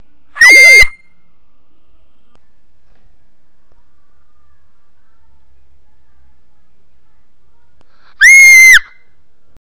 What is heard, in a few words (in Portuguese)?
gritooo